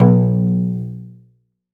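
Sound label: SOLO CELLO, Synclavier Strings; ILIO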